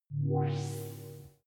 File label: sci-fi boot bell smooth